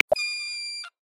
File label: Yellow-throated Marten, South West Mountain; Xiaomi Redmi-8A